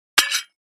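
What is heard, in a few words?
Large Tile Square Pieces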